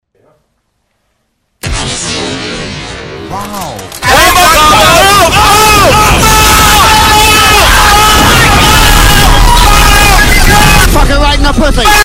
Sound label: follower alert